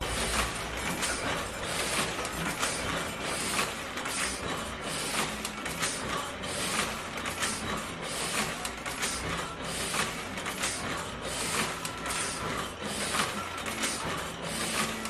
old paper printing machine; la paz; your free sounds

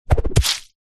warfare throw knife spin hit body squelch

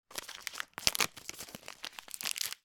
opening a cereal bar packet

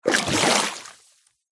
nature water med shallow movement slosh

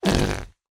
cartoon whoopee cushion air release fart soft

